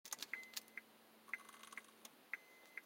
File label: sizzle crackle noise dash radio area, honda accord; crosstour 2003-2012